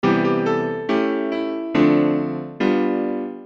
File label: secondary dominant